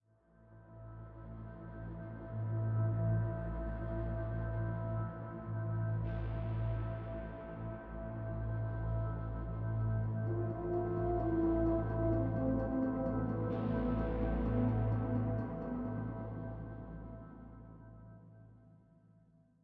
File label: Combat Lite Mood